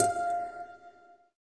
SYN PIZZ, JD 800; Sound & Vision - Gigapack I CD 2; JD 800/990

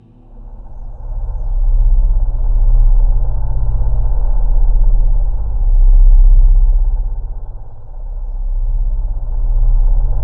C1, Synth Leads

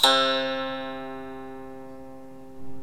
CHINESE KOTO; OMI - Universe of Sounds

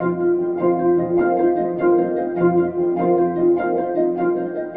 Guitar Textures

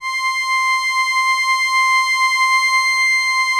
LAMBDA, String Machines

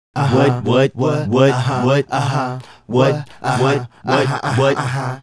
Male Group, Hip-Hop and RnB Vocals

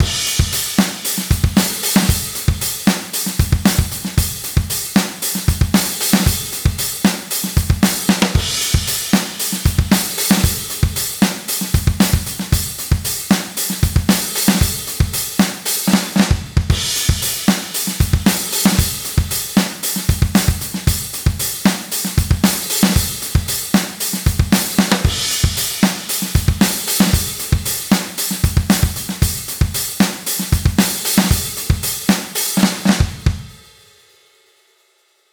Groove

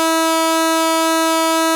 2600 E4, ARP 2600 Synthesizer; Hollywood Edge - Giorgio Moroder Rare Synthesizer Collection

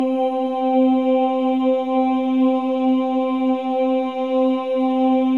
OHS, Symphony of Voices; Spectrasonics

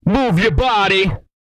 move, vocal, hip-hop; TB HIP-HOP

